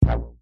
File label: foley rubber band ping low pitched loose hard